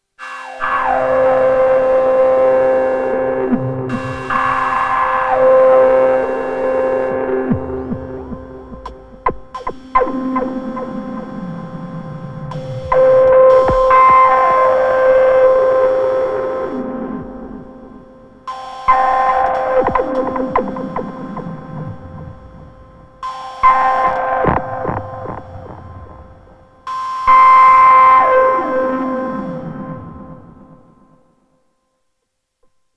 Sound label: Neutron Space Probe FX; Behringer